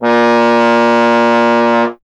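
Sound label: bass trombone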